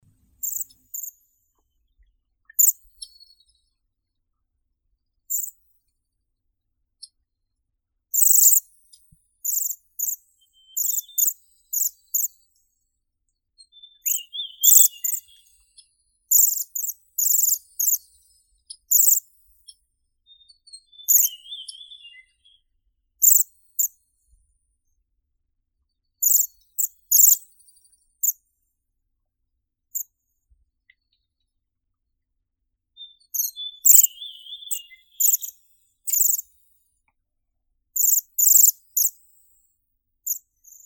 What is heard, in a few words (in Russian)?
sviristel obiknovennii bombycilla garrulus, zvuki prirodi